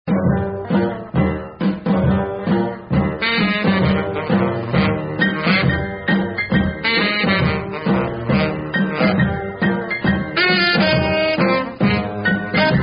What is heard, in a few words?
Mambo Blues